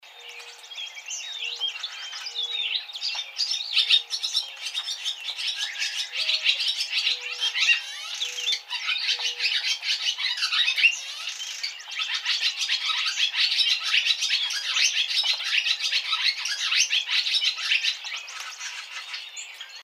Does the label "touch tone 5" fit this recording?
No